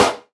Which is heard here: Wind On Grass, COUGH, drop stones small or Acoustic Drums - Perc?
Acoustic Drums - Perc